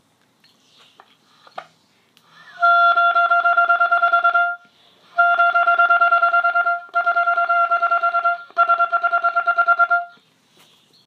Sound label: My Reed Articulate